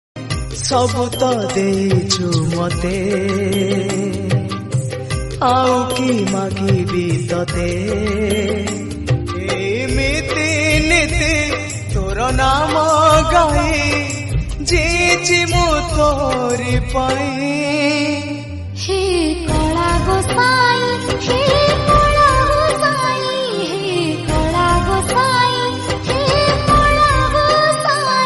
odia new jagannath bhajan ringtone

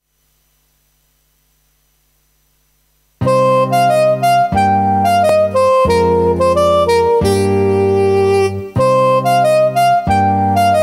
song interlude keyboard

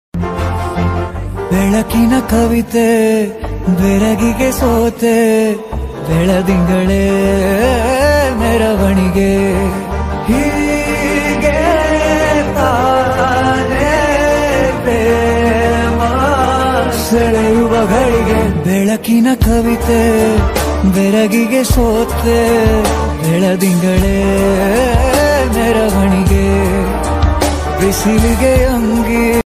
movie bgm love ringtone; banaras movie

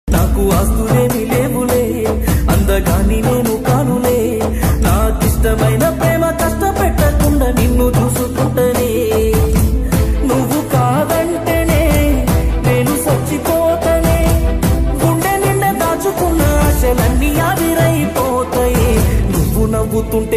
telugu song ringtone

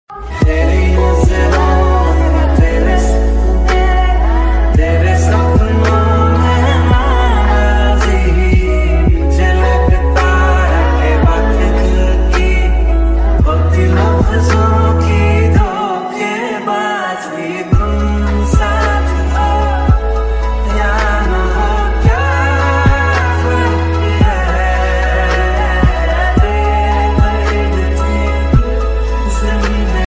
remix ringtone

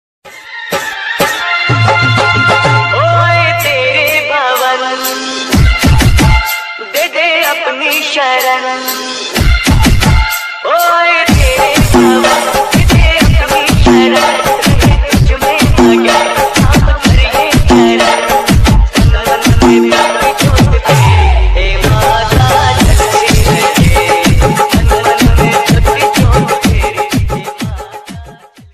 bhakti ringtone; durga puja

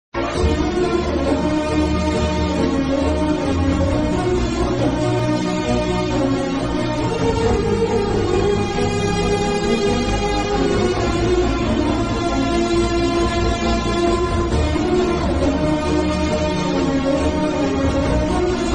sad bgm ringtone

